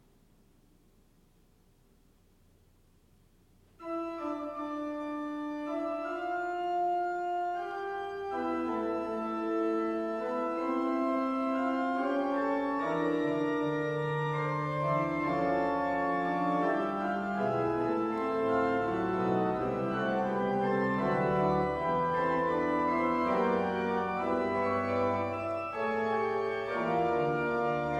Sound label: Fugue, organ; Ellen